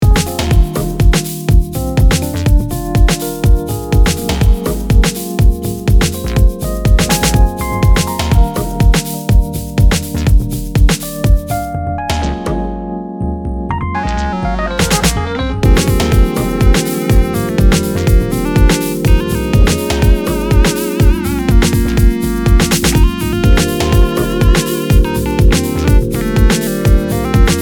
Instrumental; house

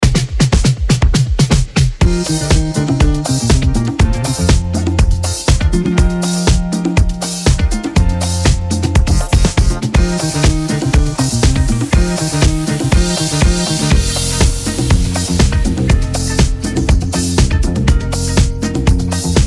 Instrumental

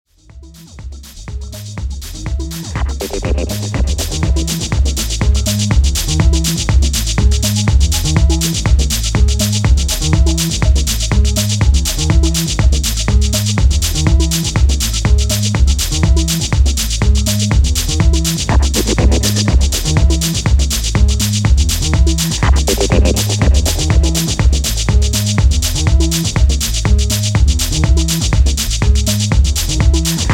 Acid Strings

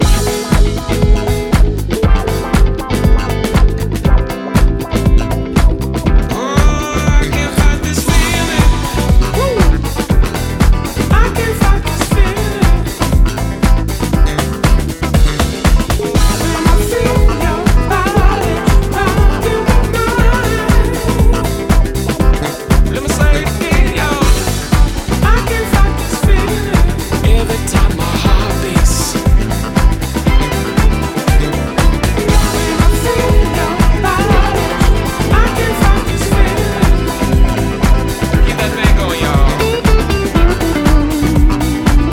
Guitar Dub

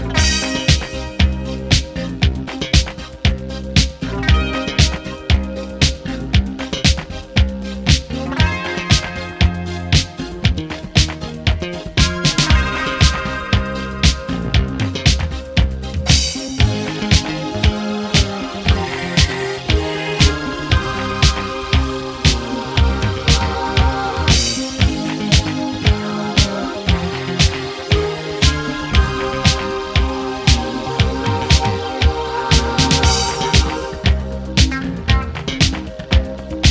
Instrumental Mix; italo-disco

